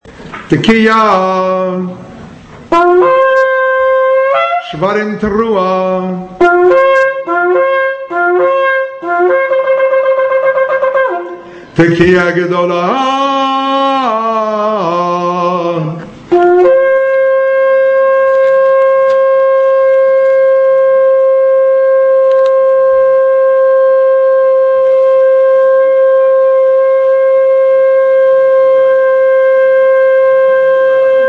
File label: Shofar Antelope's Horn 4 Blasts; at end of Yom Kippur